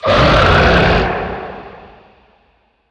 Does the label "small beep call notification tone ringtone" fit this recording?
No